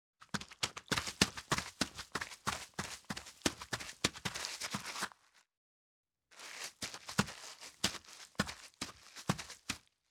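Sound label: Footsteps thick running grass; Fairlight Sound Lib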